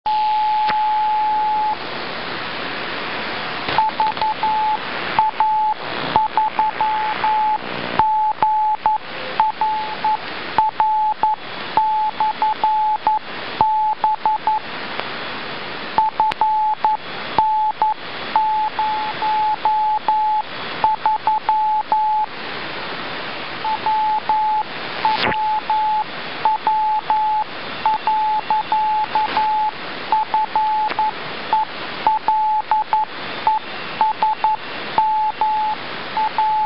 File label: mode beacon; va3grr